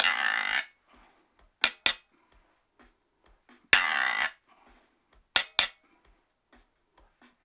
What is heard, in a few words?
129, PERC LOOPS; Drumdrops In Dub VOL-1; 121-129 BPM